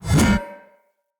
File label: Two Handed Mace Whoosh Heavy D; Weapons; Enemy; Blunt; Sinecure Two Handed Mace